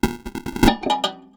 Raw, Tin Of Guitar Strings, contact mic; musicradar; contact mic madness

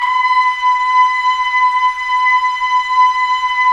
Trumpets; Roland - LA Composer vol. 4

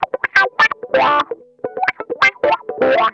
WASPISH, Funk Guitar; Zero G - Funk Guitar

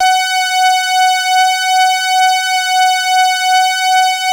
BROOMSTICK; Masterbits; Vintage Voltage

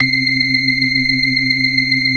organ; Keith Emerson Signature Series

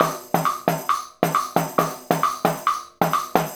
Tambourine and Wood Block; Combo

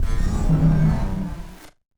Battered Synth; malfunctioning synth samples

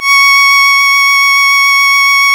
C#, strings; Big Fish Audio

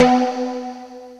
BARREL, K1 KAWAI; East West; Global Sounds

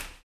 STOMPS; Spectrasonics; Vocal Planet; Blues Soul

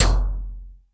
hard drums; 90 sSampleCDs; Crypton - DrumBase5000

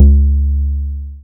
BAS SH-101; Best Service Gigapack 2

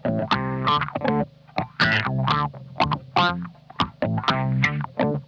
DIRTY OCT, Funk Guitar; Zero G - Funk Guitar

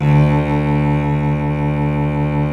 SOLO CELLO

